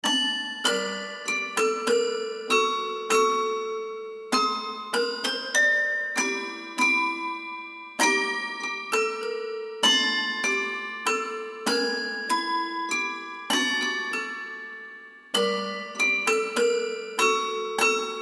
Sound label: Broken Toy Box; ホラー